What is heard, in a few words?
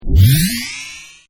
boost; kickbuttowski; mellowbrook